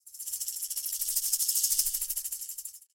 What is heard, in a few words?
rattlesnake; wonder-junction